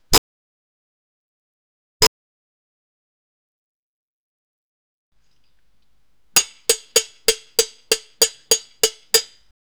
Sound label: beat by rod stick, wooden